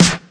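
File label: classic hip hop snare; STYLE KITS (Hip Hop, Etc); Classic Hip Hop Mega Kit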